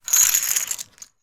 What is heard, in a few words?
foley chips tokens plastic x10 scoop up from table into hands